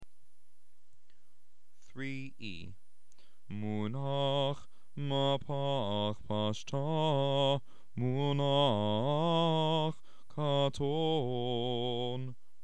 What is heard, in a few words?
Haftarah Trope C